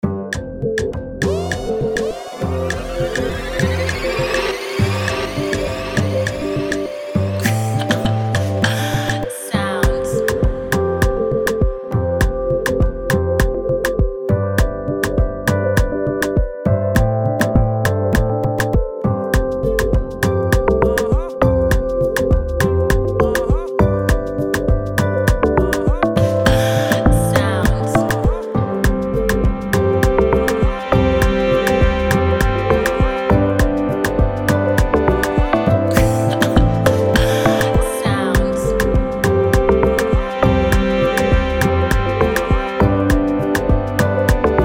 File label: Freebeat; Prod By Soundz Beatz